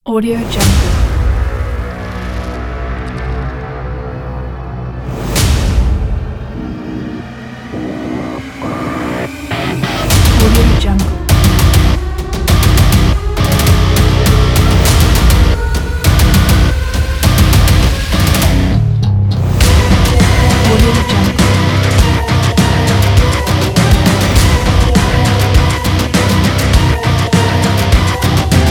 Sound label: aggressive metal choral trailer